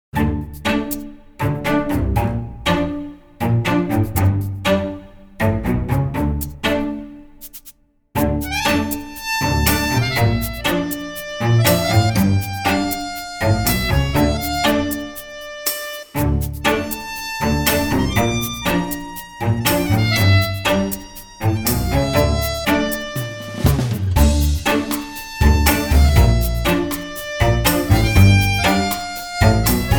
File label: Tango; Comic 드라마